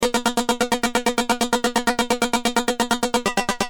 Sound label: Keys, Industrial